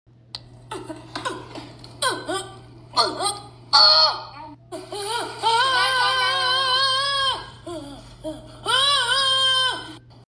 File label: kid ahhh